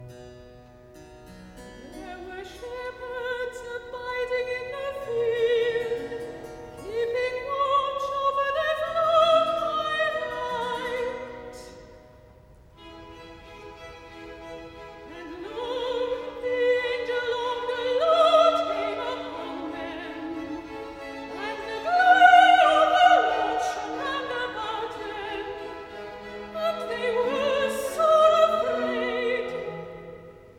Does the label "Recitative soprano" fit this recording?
Yes